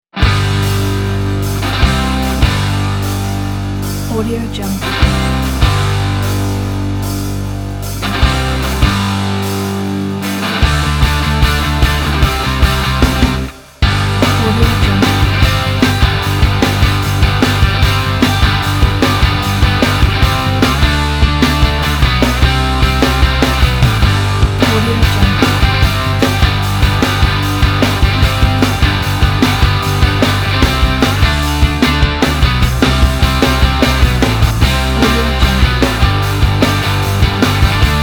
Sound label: traveling rock